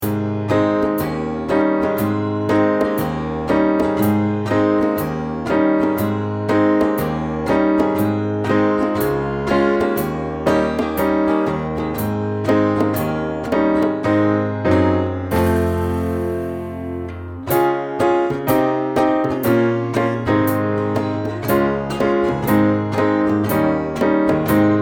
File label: catchin snowflakes instrumental